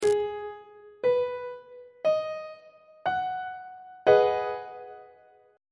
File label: b major 6th 3rd inversion; on treble clef